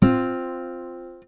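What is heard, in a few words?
perfect fourth harmonic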